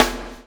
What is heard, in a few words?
CHAIN; Spectrasonics - Backbeat